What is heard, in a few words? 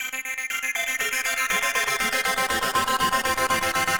Kaossilator processed (120BPM); musicradar